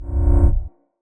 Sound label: UI Zoom Out; Rollovers